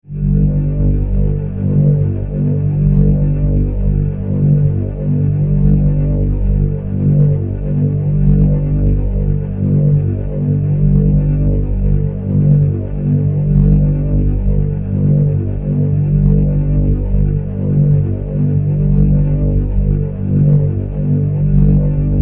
Force, Field, Energy, Source, Scifi; BlastWave

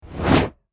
Production Element Whoosh Reverse Fast; Whooshes; Sound Design; SFX Bible

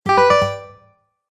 Game Bonus Ascending Strum; Video Game Sound Effects

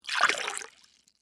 Water Pour Chalice, Into, Metal, Cup; Pouring; BlastWave

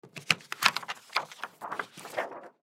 X-Ray Remove From View Box; Emergency; X Ray Machine Sound Effects

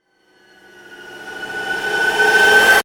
Riser Suction; Ascends; Sound Design

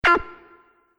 Multimedia Button

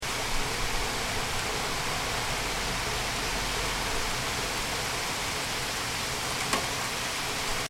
Rain With Raindrops On A Metal Window Sill; Rain With Raindrops On A Metal Window Sill And Cars In The Background; Crafted Signals

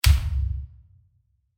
Small Impact; Bursts; Impacts; Crafted Signals

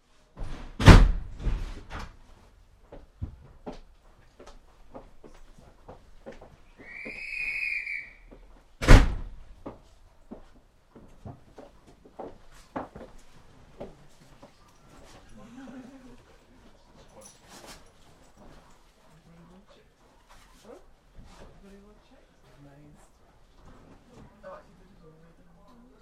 Train Electric Interior in Station then Departs; Door slams whistle - interior voices then departs

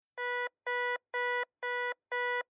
Metal Detector Found Metal Beeps Slow Electronic; Miscellaneous Electronic

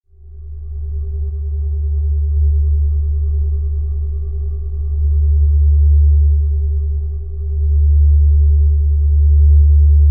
Spaceship, Spaceship: Ambience; Spacecrafts; Stuart Duffield

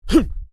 Male Vocalization Hun; Exclamations; Voice

